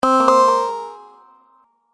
Wrong Interface Sound, Button Sound Effects

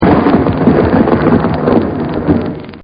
Thunder Sheet; Buttons; Multimedia; Partners In Rhyme

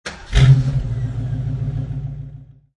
Mustang 68 Fastback Start Up Exhaust Perspective; Car; Cars

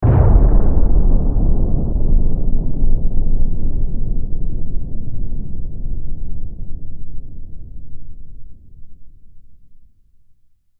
Science Fiction Planet Explosion Deep Space; Sci-Fi

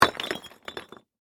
Impact Rock Single; Materials

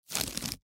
Bandage Open Peel, Bandage Open Peel Wrapper; First Aid; Hospital; Emergency; Hospitals (Sound Effects:Backgrounds)

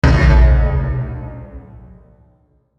Hit Descend Power Down; Descending; Blastwave

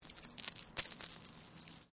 Coin Penny Multiple Drop Stone

